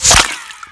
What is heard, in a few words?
weapon impact water; Spearhead Demo; MacFormat 2003 June